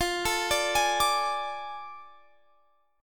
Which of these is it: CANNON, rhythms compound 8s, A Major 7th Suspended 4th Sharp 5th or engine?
A Major 7th Suspended 4th Sharp 5th